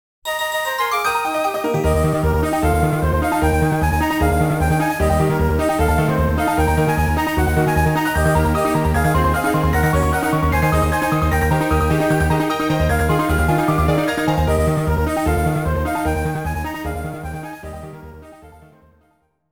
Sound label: Victory Against Trainer!; Pokemon Diamond & Pearl Super Music Collection